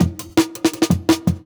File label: Jungle Breaks